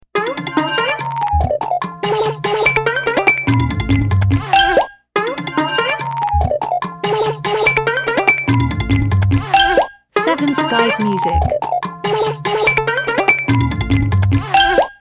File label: Fun time loop